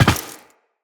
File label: land glass, footsteps; Characters; Tenno